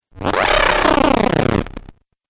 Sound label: Game Glitch Effect, Video Game Sound Effects